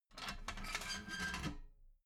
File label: metal ladder small handle fold soft